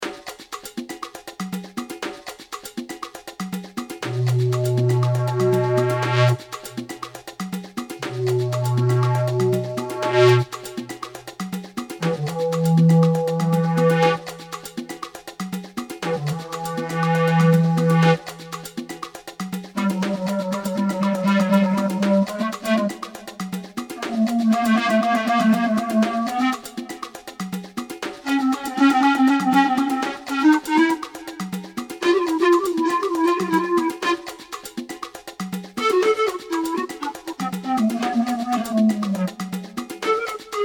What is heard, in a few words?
Square Pan Flute; Randy Felts